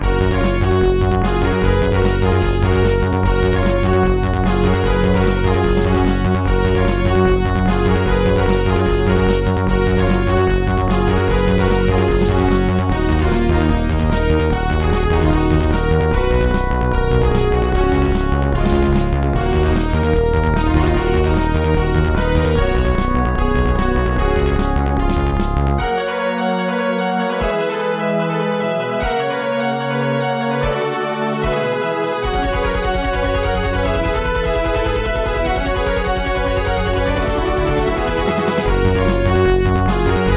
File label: turtlebeach tropez; Chips: WaveFront ICS2115v, Crystal CS42; soundcard test; Tyrian; Soundcard test page